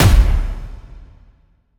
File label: Short Impact